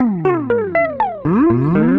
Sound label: Guitar Slides; Guitar and Bass Effects; Ableton; Factory Packs